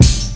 old school acoustic kickdrum sample f key

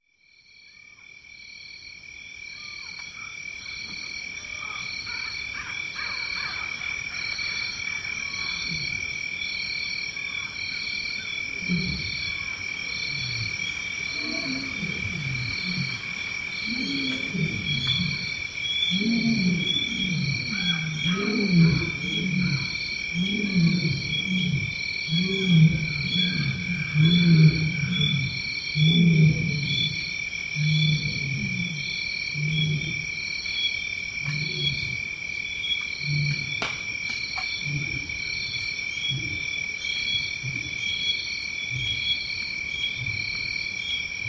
lion and monkeys in tanzania; soundscapes

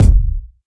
reverb bass drum single shot a sharp key

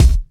mid range bass drum one shot b key; kick drum samples

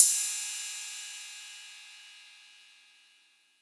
long tail reverb crash cymbal audio clip a key; tuned cymbal

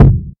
round kickdrum c sharp key; kick drum samples

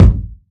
kickdrum sample g sharp key

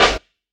large room acoustic snare sample f key; snare-drum-samples

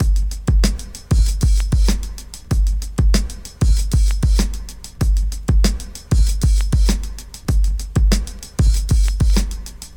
96 bpm fresh breakbeat g key; drum loop

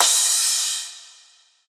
large room crash sound sample A Key; cymbal drum samples

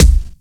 raw kickdrum sample c sharp key

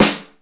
sizzly acoustic snare sample f sharp key

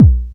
short kick drum b key

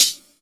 dampened pedal hi hat sample g sharp key

